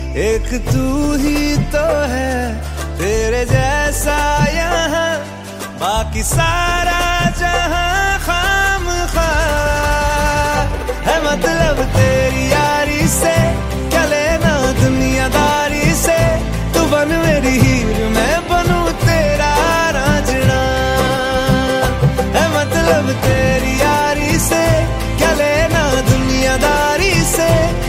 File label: hindi ringtone; bollywood ringtones